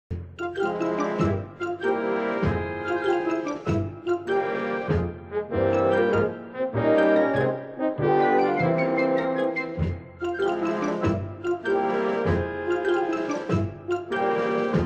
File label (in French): marche funebre dune marionnette; hitchcock